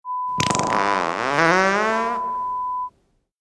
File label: Fart sound